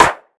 dope snare single hit b key, acoustic snare sound tuned to the B note